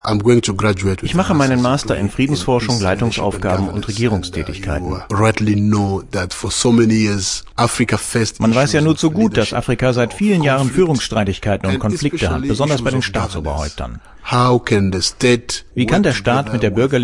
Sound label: university choir; simbabwe